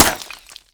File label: bullet hit pot